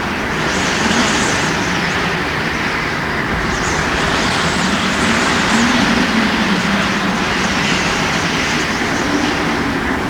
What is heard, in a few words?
whistling wind